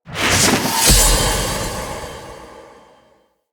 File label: Mastery Rank Up, UI